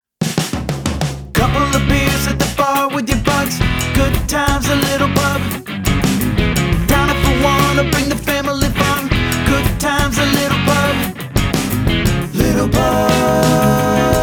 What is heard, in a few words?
jingle prog rockin; Little Pub